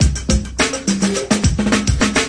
105 jungle, drum loops and breaks

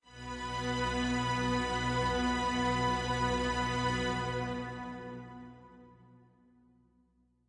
Heavy Strings F; PADS and STRINGS

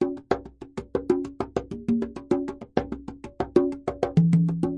plain conga continuous, 102 bpm, drum loop; 101 to 110 bpm

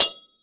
chappa, Chappa and Chanchiki Cymbals; ETHNIC and WORLD PERCUSSION

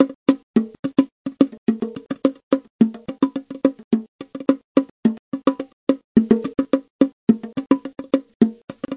silly bongobeat, 107 bpm, drum loop; 101 to 110 bpm

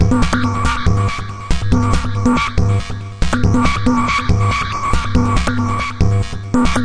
140 hexbeat, drum loops and breaks

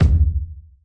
kiks

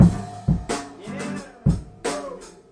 yeah woo, 088 bpm, drum loop

